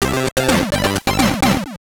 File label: Win Game; Power Spike Pro Beach Volleyball 2000; GBC